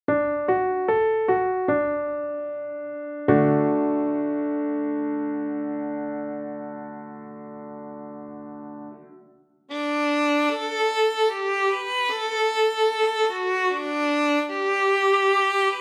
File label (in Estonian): Viiul; d-duur